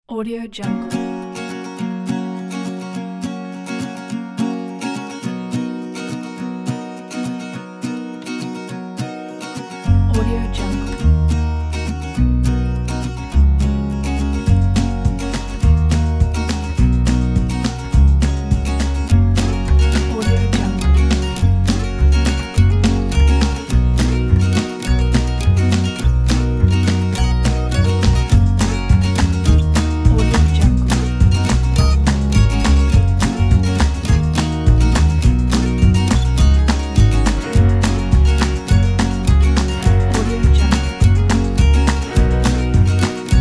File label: happy guitar pack